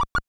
movement ladder loop, arcade game sounds, Climbing Ladder